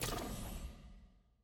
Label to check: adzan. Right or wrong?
Wrong